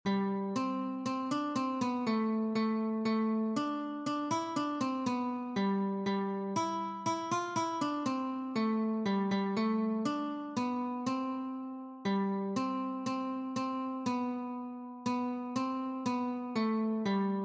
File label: We wish you a merry Christmas several strings